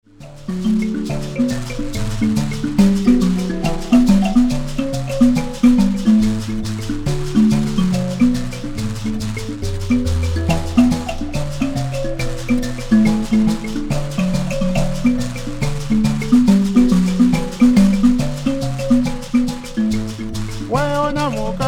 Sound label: nemakonde tuning mbira vocals